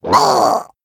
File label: Murloc Death; Cactus Cutter